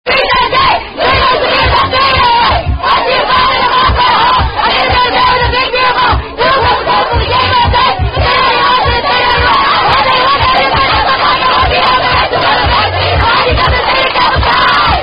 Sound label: Theta Chant